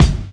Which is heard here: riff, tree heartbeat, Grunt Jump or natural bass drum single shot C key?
natural bass drum single shot C key